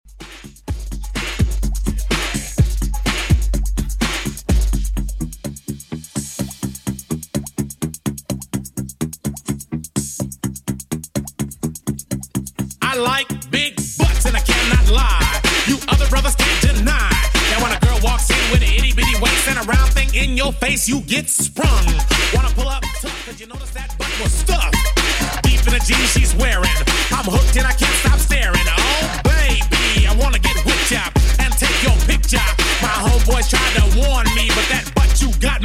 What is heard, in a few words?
90s Redrum, 126bpm